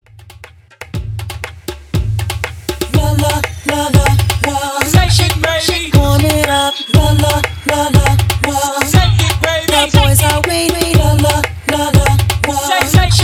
Top40 Hype 120 BPM

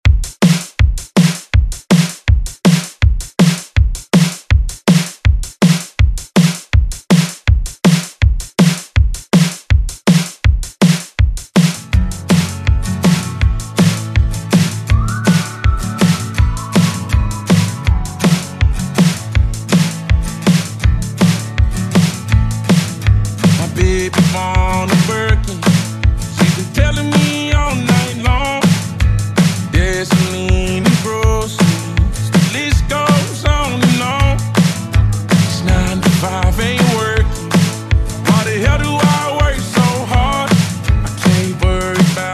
remix, 163bpm

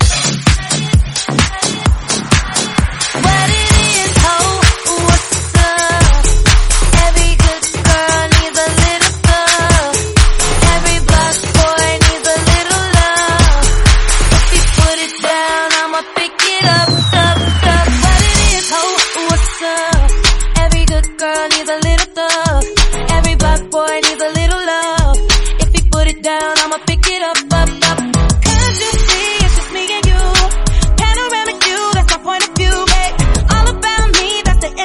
Transition 130 95

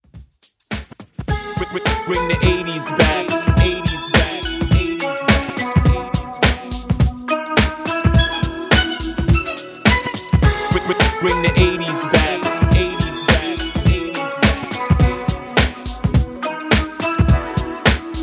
Classic 80s Hype, 105 BPM; ReDrum; Dj Intro Outro

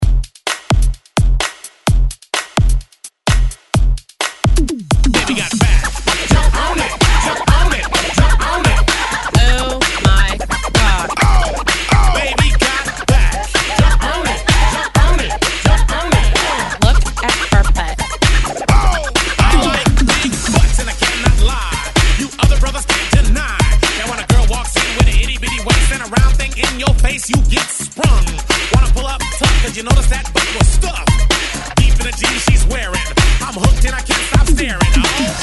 90s ReDrum 128 Bpm; DJDOLLS

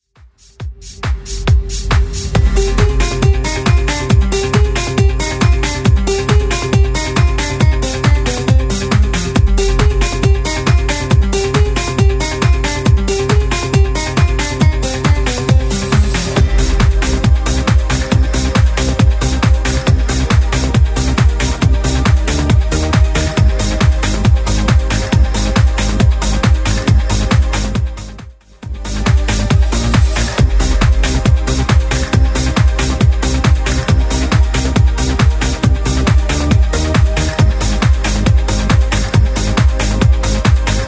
90s, 137bpm; Redrum

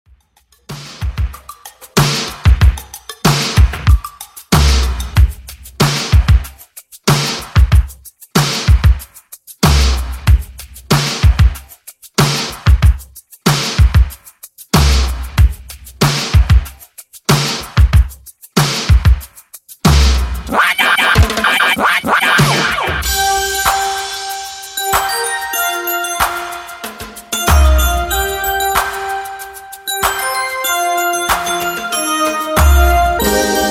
80s Mixshow Intro Outro; 94 Bpm

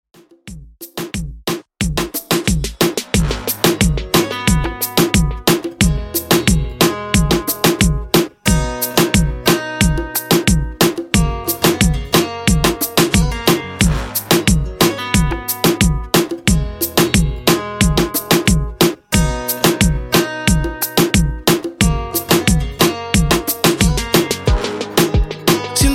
Reggaeton 90 Bpm

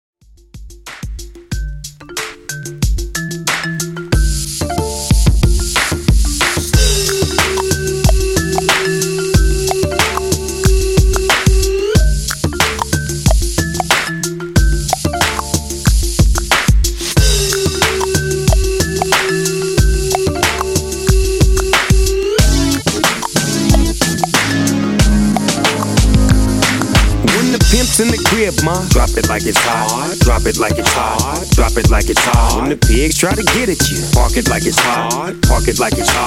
Hip Hop 92 Bpm

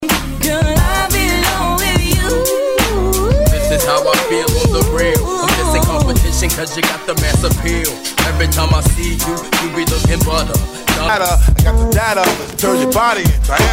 Old School Hip Hop Megamix

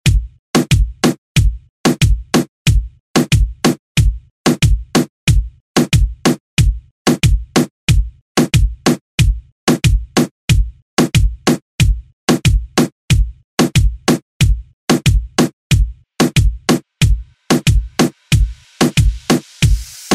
Latin Urban Redrum; Deejay Hit

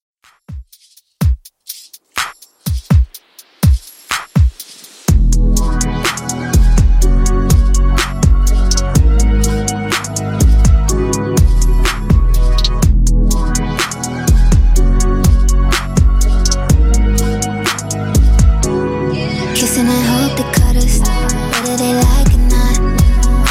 Top40 Redrum 62bpm

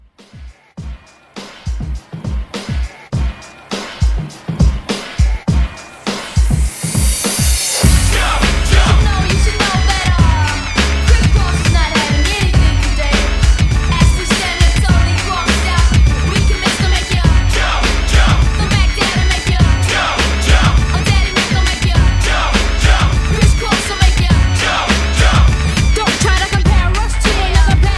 90s Mixshow Re Drum 102

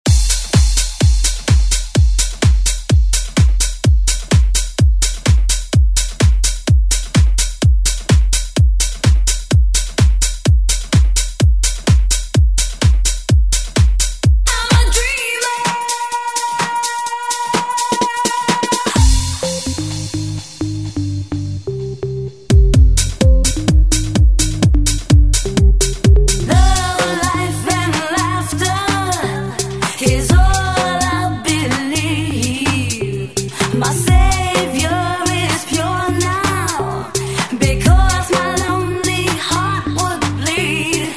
90s ReDrum; DJ Mhark

